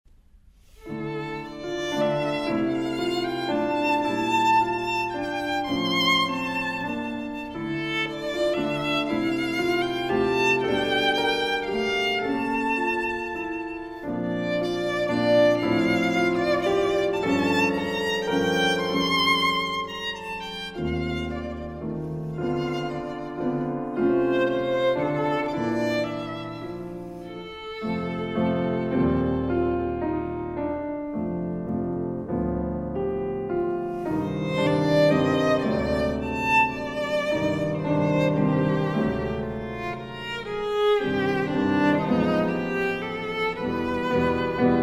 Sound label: violin